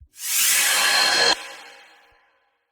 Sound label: pow